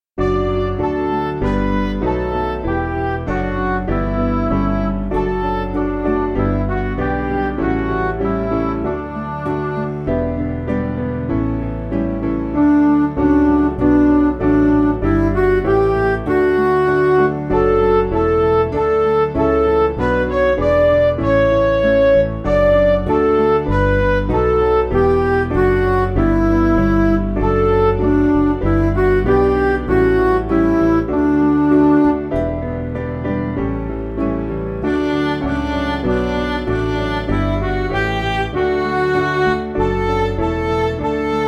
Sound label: Father Lead Me, Midi